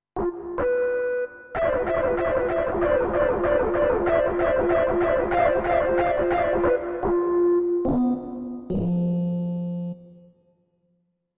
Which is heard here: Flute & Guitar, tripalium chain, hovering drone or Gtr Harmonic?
Gtr Harmonic